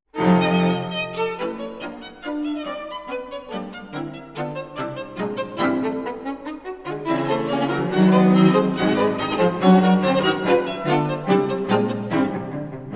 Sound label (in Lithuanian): kvartetas Allegro; Bacevičius